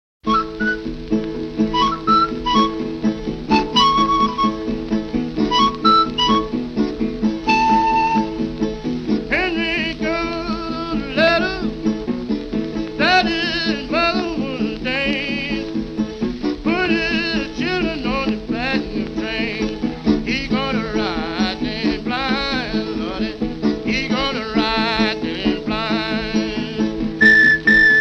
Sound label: Flutes de Pan Quills